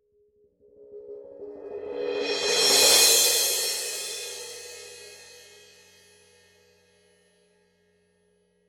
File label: Paiste PST5 18 medium crash roll